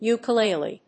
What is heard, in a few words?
ukulele